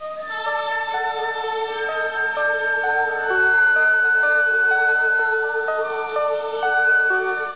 keys, Sad

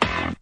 hardknock

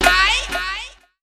aii; ooh ahh

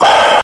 breath

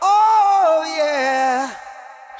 "oh yeah" SUNG

